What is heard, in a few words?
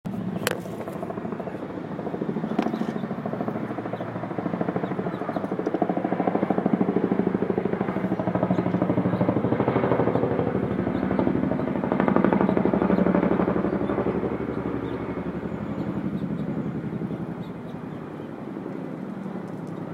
chopping and chirping